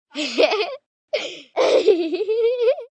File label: Laugh Multiple, 1 girl, 9 yrs, light-hearted giggle; human voice, child, kid, female; Laughter; VoiceBox